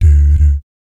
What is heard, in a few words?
"BS D D", vocal jazz fx